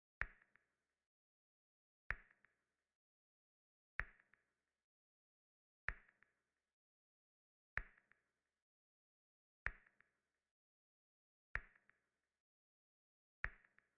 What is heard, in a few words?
127 BPM B Min Percussion; Trap Melody Loops; Loop Stems; Cymatics; Spirits